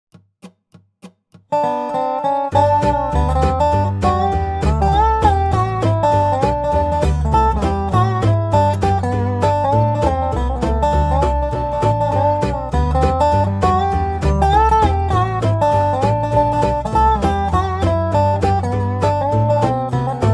the Snowman Dobro with backing track 100bpm; int adv